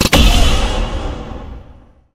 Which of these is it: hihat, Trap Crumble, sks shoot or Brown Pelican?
sks shoot